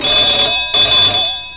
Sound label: TEL BELL; cstrike; ambience